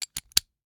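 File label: Ratchet, vanadium steel, 8 in, standard, locking, clicking, mechanical, new, socket lock, fumble; Eiravaein Works; Vaeyan IV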